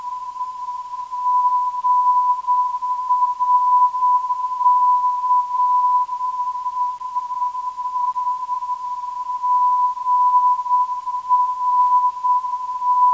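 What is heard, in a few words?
non-directional beacon; GRK; 358kHz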